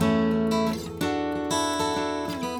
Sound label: Guitar AD, Acoustic